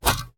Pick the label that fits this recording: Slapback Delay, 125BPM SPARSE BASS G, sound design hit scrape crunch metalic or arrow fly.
sound design hit scrape crunch metalic